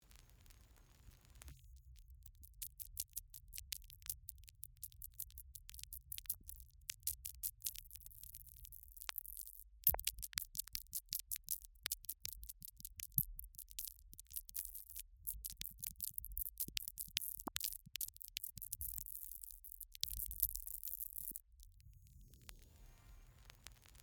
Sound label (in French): Test Indicatif des soneries Olympique CLICK